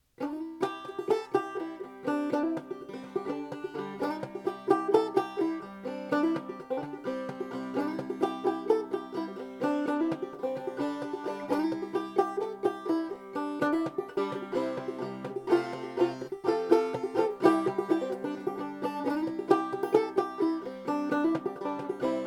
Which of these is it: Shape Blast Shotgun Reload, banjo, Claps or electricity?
banjo